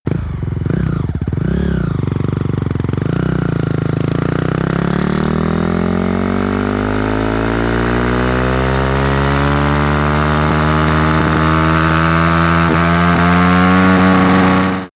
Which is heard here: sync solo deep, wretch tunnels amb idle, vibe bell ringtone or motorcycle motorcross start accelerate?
motorcycle motorcross start accelerate